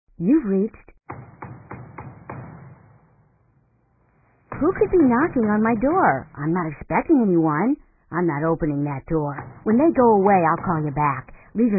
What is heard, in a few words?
Visitor at Door